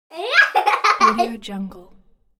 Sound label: baby laughing